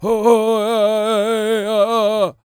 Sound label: World Voices, CHOCTAW